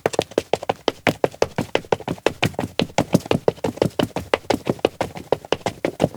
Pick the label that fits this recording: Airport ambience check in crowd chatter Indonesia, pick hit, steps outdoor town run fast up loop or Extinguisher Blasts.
steps outdoor town run fast up loop